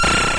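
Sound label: c64 SID random, Commodore 64 8bit SID Drums; LO FI and 8 BIT KITS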